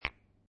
Billiard Pool Snooker Two Pool Balls Together Softly With Rattle